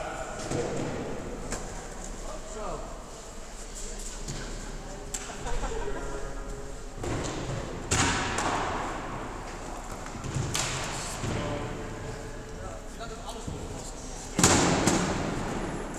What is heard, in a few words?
foley